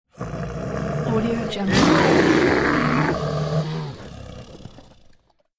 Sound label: evil bear roaring